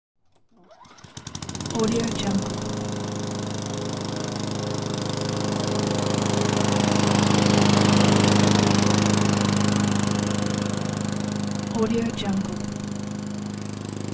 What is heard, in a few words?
lawn mower starts moves and off; hollywoodedge